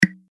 reelspin click; Daji; League Of Gods

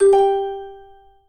collect freeround end; Fire Queen

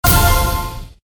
bonus chance; WolfMoon

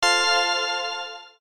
autoplay on; Flying Dutchman

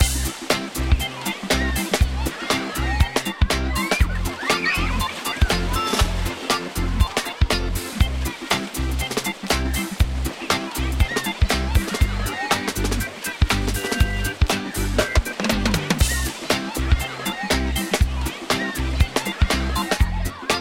gambler ambient; Hot Party Deluxe; Wazdan

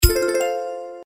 hit; Horoscope

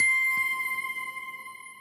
bom spin stop bonus symbol; Great Book Of Magic Deluxe; Wazdan

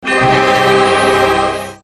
transition start; games; Book Of Fortune

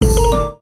stop bonus; games; Book Of Lords

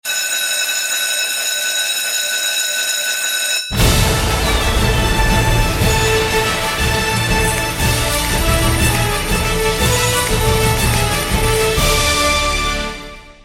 WIN MAX; Fruit Tumbling

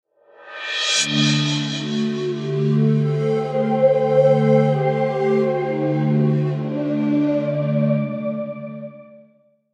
init melody; games; Book Of Lords

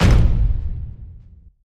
big golden wild statues land; The Golden City

